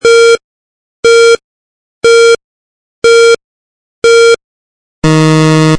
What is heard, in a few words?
countdown; synced timer